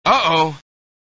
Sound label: tile